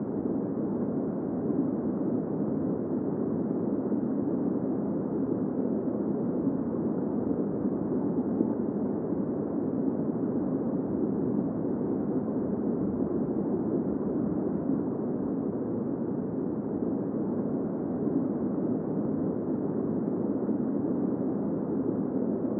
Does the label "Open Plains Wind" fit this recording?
Yes